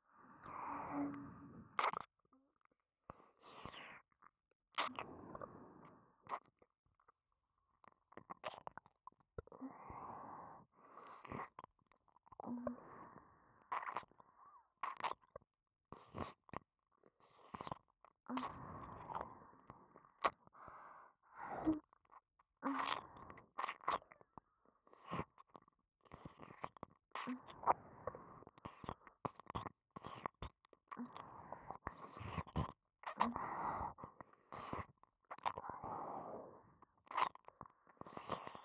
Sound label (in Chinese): 舔耳 弱